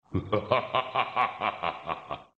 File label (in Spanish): risa; shao kahn